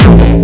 Bombo Hardcore, kicks

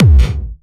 Kick

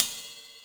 CYMBAL, Yamaha RM 50

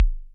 kicks, Bass Drum

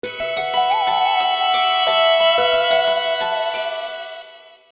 Japanese alarm; Nokia Ringtones; Audio themes

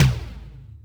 Yamaha RM 50 Toms

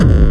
Hard bass, kicks

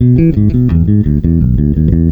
Triplets; Bass Drum - Kicks; GroovMaster

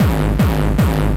Hard Kick

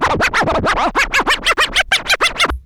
scratch

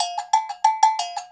cowbell loop

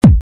kick

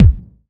kik, Bass Drum Kicks

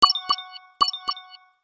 Electricity message; Nokia Ringtones; Audio themes

